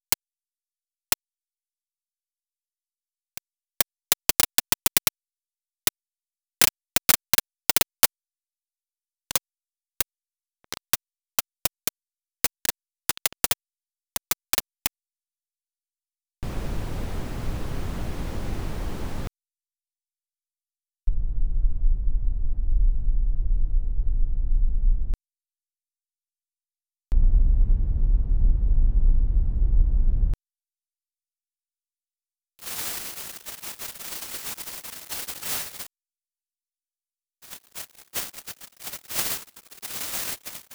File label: fire; designing sound